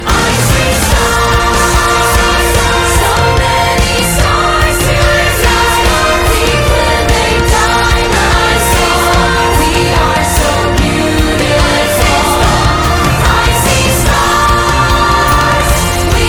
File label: vocals ensemble; Mean Girls